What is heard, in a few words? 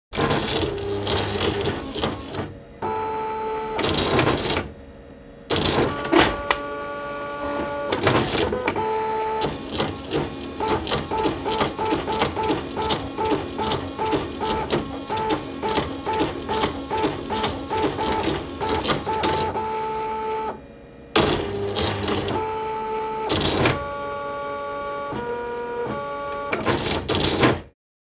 printer